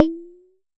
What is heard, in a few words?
Jomox MBrane, drum machines; Jomox MBrane 11